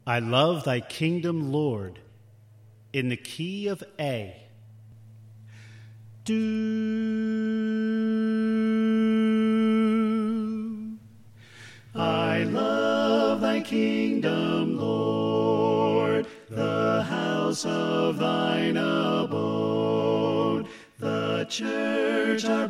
Soprano Left; I Love Thy Kingdom Lord

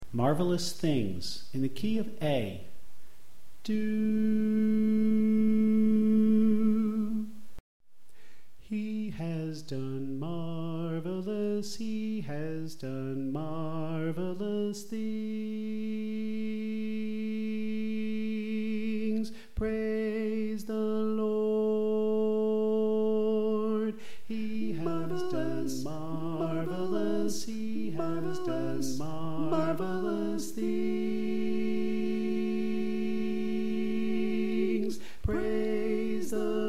Soprano Left

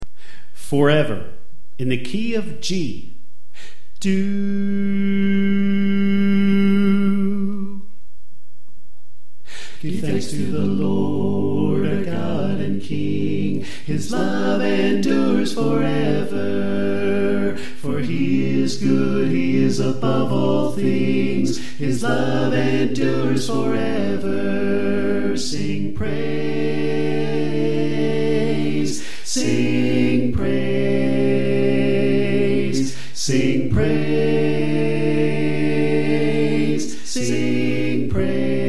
Bass Left

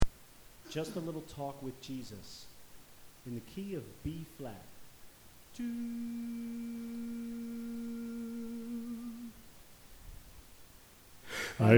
Bass

